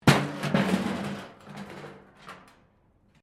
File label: metal rubbish bin smash concrete